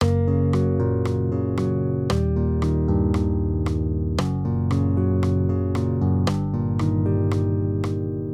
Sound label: maj triads; accompaniment